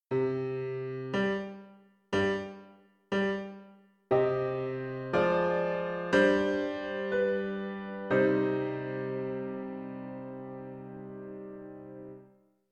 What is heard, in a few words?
Piano